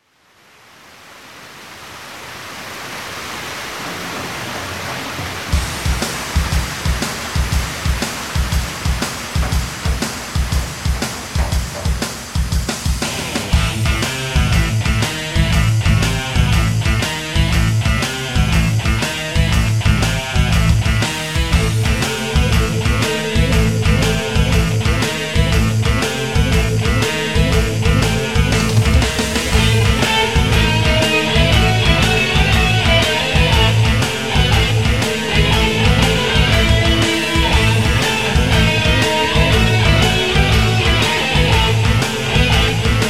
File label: Live Guitar; FFVI